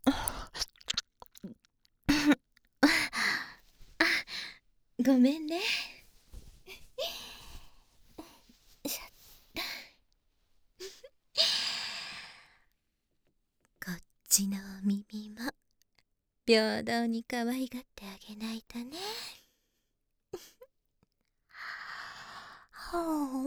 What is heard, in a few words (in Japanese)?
耳舐め・左; お姉ちゃんの番だね